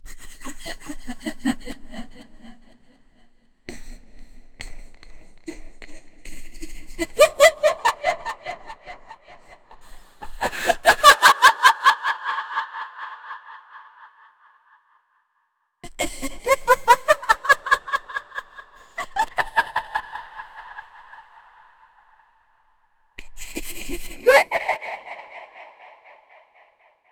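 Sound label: evil female sinister laugh; character voices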